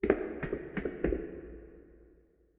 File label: trigger footsteps concrete; Minetest; backrooms